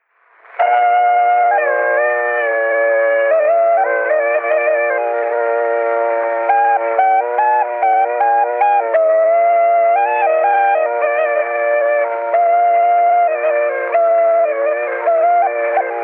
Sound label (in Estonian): torupill; Torupilli Jussi 44. lugu; Emmaste